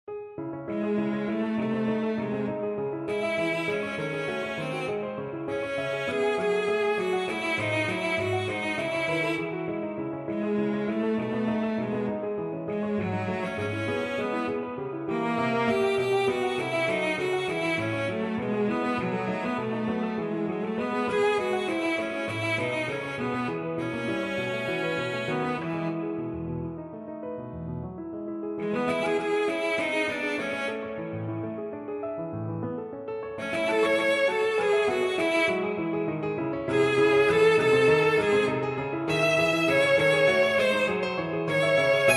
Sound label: Meditation